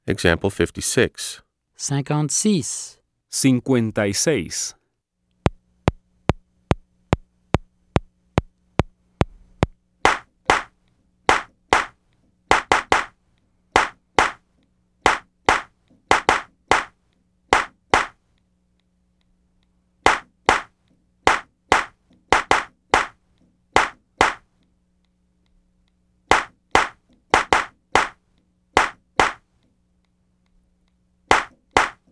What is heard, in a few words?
Keyboard